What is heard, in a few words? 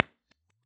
Science 2x15 3015 RE20 3in, Bass Cab IRs